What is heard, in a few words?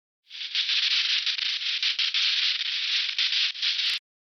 Radio Static Sci-Fi; Hollywood Edge; sound designer's toolkit v2